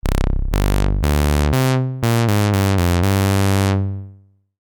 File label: Model 12 12 dB HighQ